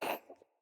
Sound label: Kitten Cough Hairball; Catbrow